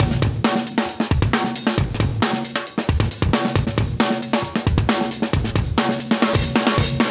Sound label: 135 Funk, breaks